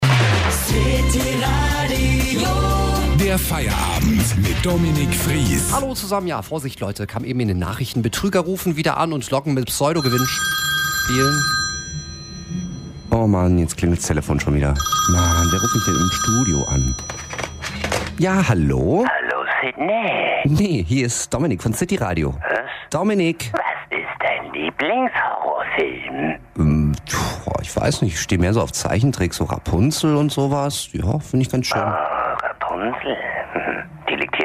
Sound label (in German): Opener Thema Scream; Radiomoderator; Stunden Opener – Thema „Scream 4“